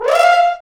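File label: french horns rips; Roland LCDP06 Brass Sections; F.Horns FX+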